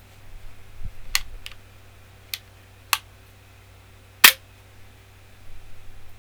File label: Rifle Lock; forlorn